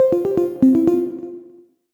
mobile game melodic stinger minimal puzzle complete